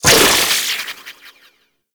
Arcane Generic Proc; Warframe; Mods

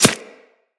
Arrow Impact Flesh; Weapons; Bullet Impacts

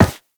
Run Metal, Footsteps; Warframe; Characters; Operator

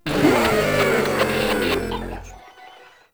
Proto Infested Runner Death, Enemies; Warframe

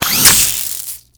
Drone Inject; Warframe

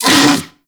Kubrow Armored Vocal Pain; Warframe

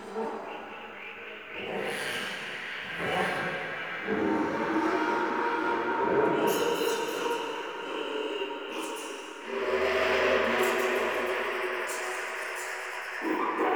Infested Eris Exterior Critter Loop

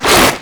Arm Attack; Enemies; Abilities; Warframe; Proto Infested; Runner

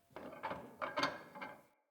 Tent Fire Pot Metal Creak; Soul Plane

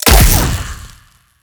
Rifle Shot, Weapons; Warframe; Wisp Rifle

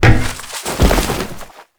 APC Dismount Foley; Warframe; Lasrian

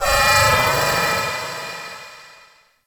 Landscape Textures, Ambience; Venus Open World; Objective Rooms

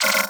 Infuse Full UI; Warframe; Warframes; Alchemist

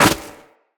Operator Run Snow, Footsteps; Characters

